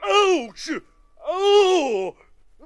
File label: ouch ow; ooh ahh